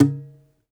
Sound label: DOMRA, Plucked Strings, Segundo Domra; E-MU Producer Series Vol. 7 – Old World Instruments